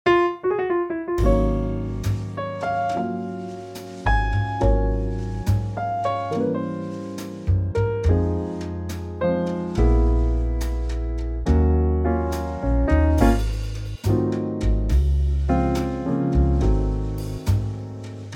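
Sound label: Db trio ballad, Backing Tracks for Male Vocals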